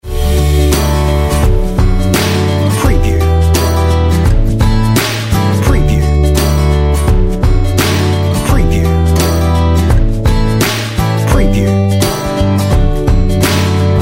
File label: On Hold Music